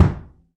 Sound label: Kick Room Bang